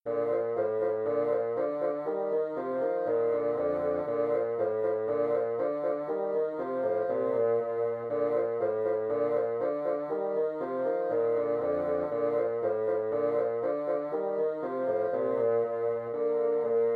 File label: 2 bsns russian folk song